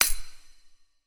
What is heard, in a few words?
clap; smite; daji